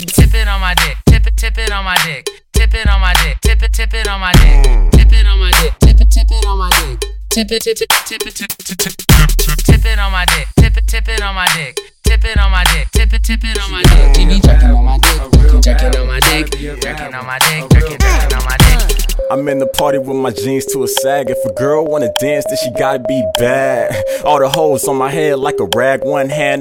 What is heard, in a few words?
rnb ring